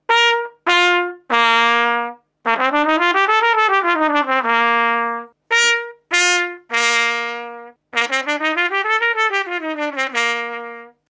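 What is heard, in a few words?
trumpet mute straight plastic; faxx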